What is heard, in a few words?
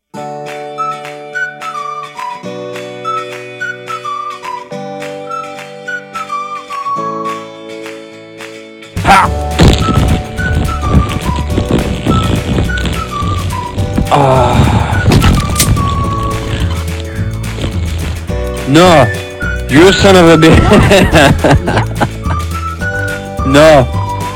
furry song